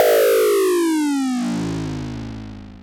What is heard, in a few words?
FX, Dual Percussion Synthesiser; Klone